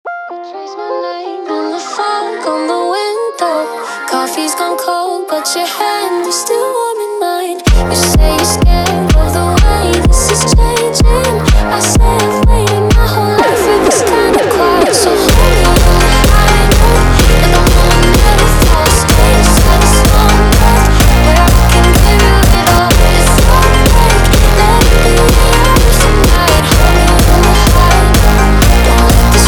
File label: High Note Jumpstyle